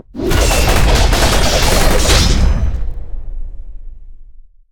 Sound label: wall of spears; odin; smite